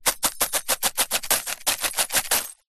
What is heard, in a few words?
glass broken pieces shake in dustpan brush; foley; zapsplat